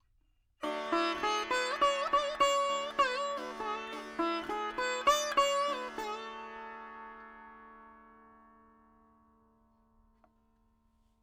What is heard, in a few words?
4 4 D 100 Dry, Melody Veena; Sample Banks